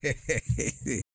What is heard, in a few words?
LAUGH, Jazz; Spectrasonics Vocal Planet; Jazz FX